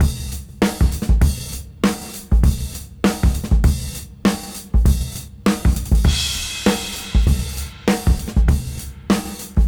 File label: Swing 99 BPM; AMG - Keith LeBlanc Phat Butt Jams